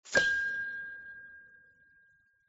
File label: note G